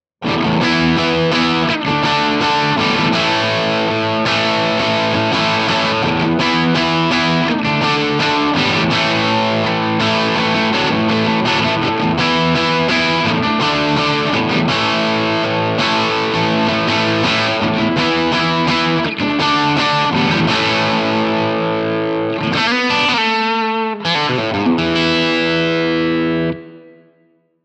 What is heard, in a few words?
crunch; jensentone